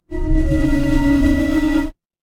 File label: magic scifi alien